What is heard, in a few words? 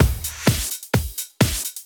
Housebeats, 128BPM; Vengeance Samples; VEH2 Loops